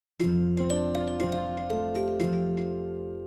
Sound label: ringtone